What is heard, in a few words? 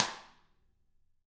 Drums, SNRR; CAMCO